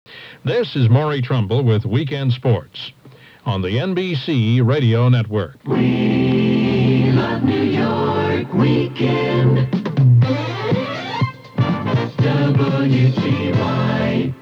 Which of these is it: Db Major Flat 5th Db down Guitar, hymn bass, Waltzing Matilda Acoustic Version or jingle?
jingle